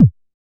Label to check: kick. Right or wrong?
Right